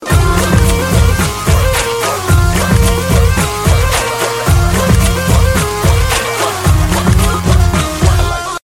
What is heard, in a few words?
sms theme ringtone; dhoom 3